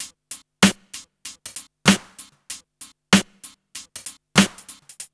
RNB 96 BEAT, Hip Hop; USB Soundscan vol.34; Burning Grunge; AKAI

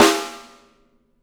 METAL, SNARE; BRASS

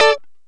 Lounge Guitar Chick Major D3; Zero-G Kocktail Kollection

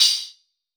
Soft Bright Crystal, Hats; Airwindows

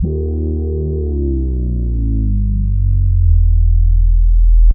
Slide Bass, Bass One-Shots, Drum n Bass; KJ Sawka - Drum n Bass & Beyond

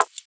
Perc; M8; Electronisounds

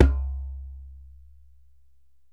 DJEMBE, African Drum; E-MU Producer Series Vol. 7 – Old World Instruments

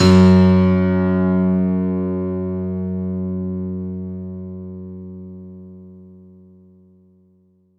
F#1 DANCE, ANALOG SEQ; Roland JV 2080

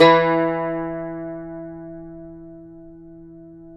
CUMBUS E3 B, guitars; Spectrasonics; Hans Zimmer Guitars VOL-2

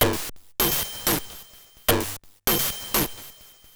Bent TR505 (128BPM), Circuit Bent

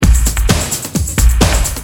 Hard, Drums Perc; Industrial

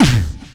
VOC DRUM, MOUTH PERC; Best Service Hyper Dance

